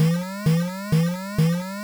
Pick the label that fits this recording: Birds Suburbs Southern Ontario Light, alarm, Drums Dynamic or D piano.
alarm